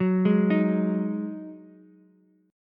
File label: D sharp Minor Double Flat 5th D sharp, down, Ukulele; arpeggiated; chord